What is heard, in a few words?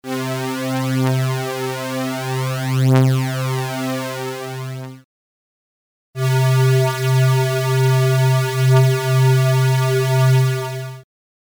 Phuzor Super Saw Square